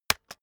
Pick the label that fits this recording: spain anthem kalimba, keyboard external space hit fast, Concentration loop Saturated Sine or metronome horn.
keyboard external space hit fast